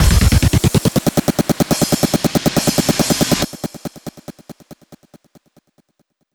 140BPM 2Bars, HandsUp & Trance Fills; Damages; Vengeance Samples

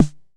percussion; Vengeance Samples

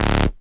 Synth Bass; Vengeance Samples; VEE Synth Shots

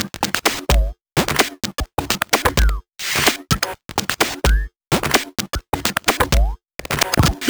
Electro Loop 128 BPM; Vengeance Samples

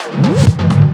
Fill Ins 128BPM; Vengeance Samples; VEH2 Loops

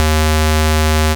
Bass D#; Vengeance Samples; Vengeance Ultimate Bass

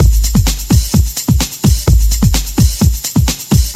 House Beat, 128 BPM; Vengeance Samples; VEH1 Loops

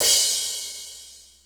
Cymbals crash; Vengeance Samples

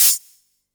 Special Hihats; Vengeance Samples; VEC1 Cymbals

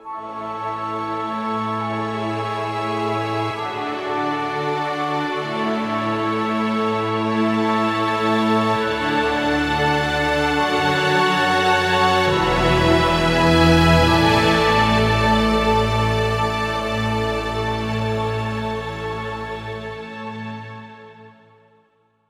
137BPM Orchestra Intro, Amajor; Unlooped

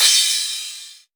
Crash, Cymbals; Vengeance Samples; VEH3 Cymbals

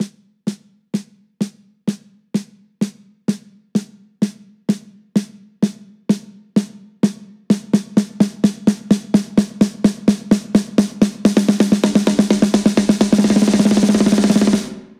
128BPM TokTok, House Electro Fills; 8Bars; Vengeance Samples

